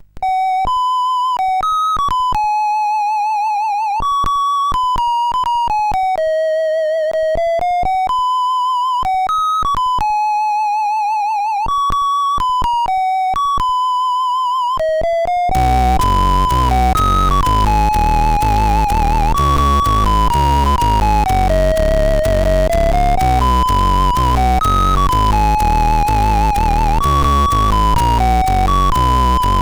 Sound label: Military, sid CSG8580R5; Eloquence